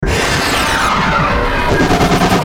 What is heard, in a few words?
Stinger Descend Digital Chopper; Production Element Imaging Element Accent Transition